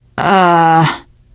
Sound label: negative noise, teen girl, survivor voice; Left 4 Dead; Source Engine